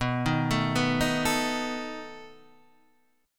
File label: B 7th B down Guitar Standard; B7 arpeggiated; JGuitar